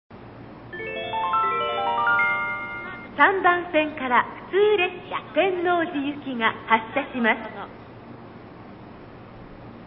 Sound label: wakayama track start bell for tennoji, eki; nishinihon